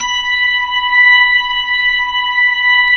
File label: B-3, Jazz